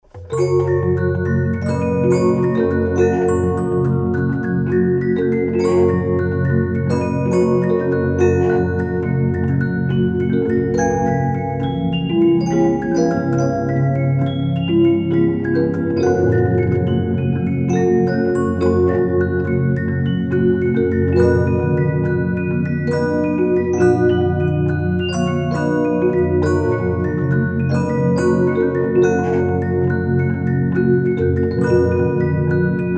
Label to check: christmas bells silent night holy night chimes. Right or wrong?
Right